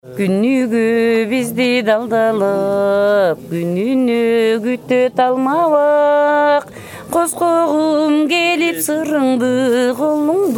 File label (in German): Gesang, Tamchy; kirgistan